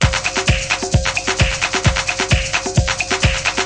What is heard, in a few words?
131 electro percussion, DRUM LOOPS and BREAKS